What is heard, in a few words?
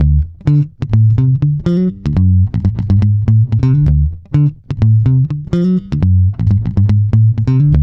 BOOG D, Bass, BOOGALOO; Spectrasonics; Bass Legends